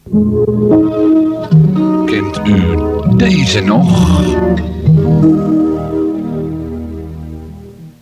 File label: sun Shine Jingle; SunshineJingles